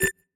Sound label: bip